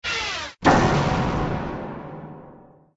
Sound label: drop piano miss